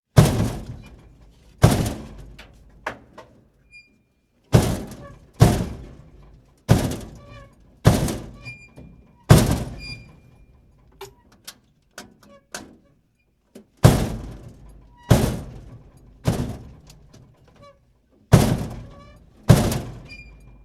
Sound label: dumpster door slam